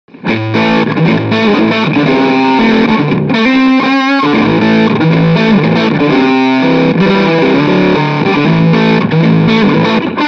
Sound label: Heavy on Both